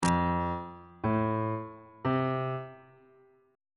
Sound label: a harmonic minor chord 6 is f major on bass clef